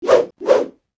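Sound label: Attack; PhinoFlie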